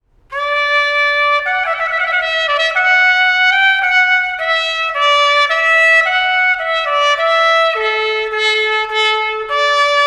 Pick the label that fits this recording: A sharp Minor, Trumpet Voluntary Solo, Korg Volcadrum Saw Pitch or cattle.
Trumpet Voluntary Solo